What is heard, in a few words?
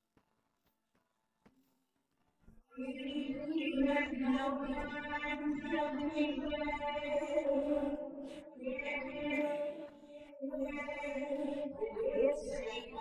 vocals noise